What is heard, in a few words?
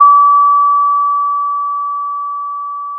Sound of a school bell